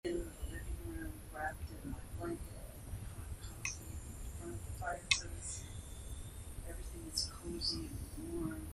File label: upstairs cry